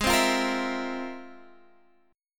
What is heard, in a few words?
F Minor Major 11th, G sharp